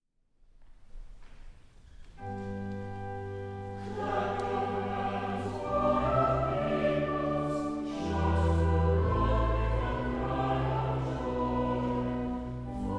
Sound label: Psalm 47, Anglican Chant; Turle